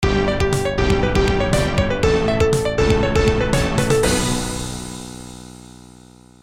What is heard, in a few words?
TRACK UNLOCKED; EDGE RALLY